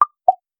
cute dating app notificat; app sounds

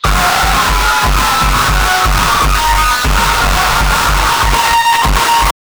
strong break

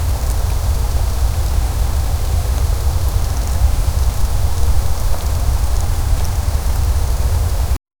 create a concise sound effect, bird chirps

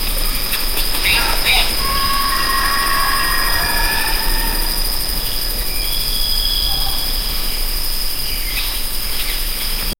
bike ride in jungle; outdoor

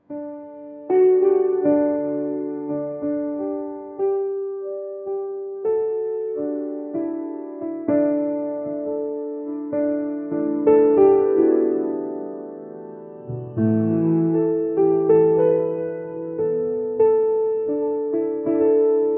calming piano playing gol

